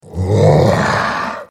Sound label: grunt